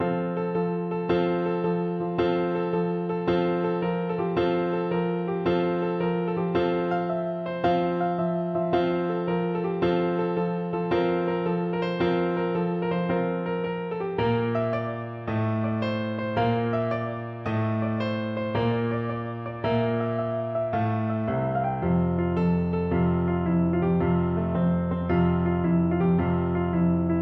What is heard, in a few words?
serenade, Flute; berlioz